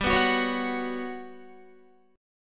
G Major Sharp 11th, Guitar; Listen to GM#11 strummed; GM#11 Chord

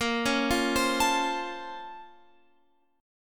A sharp Minor Major 9th

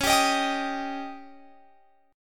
Db Suspended 2nd Flat 5th, Guitar Open F; strummed; x,20,19,22,22,22

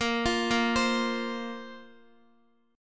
Bb Suspended 2nd Bb down Guitar; Bbsus2 arpeggiated

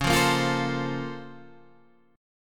A sharp Minor Major 9th

